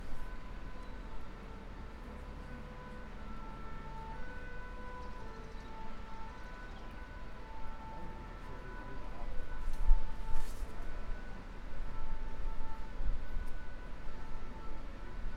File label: Bordeaux Top of Tower with Sirens; Sirens Heard from the Top of a Church Tower; Sirens and Crosswalk Signals